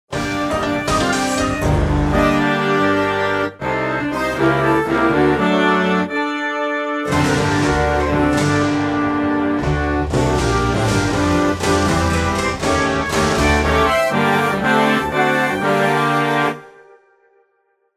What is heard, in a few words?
Fanfare; Tartini; besondere Fanfaren